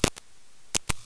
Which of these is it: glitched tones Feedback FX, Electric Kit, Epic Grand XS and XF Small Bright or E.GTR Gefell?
glitched tones Feedback FX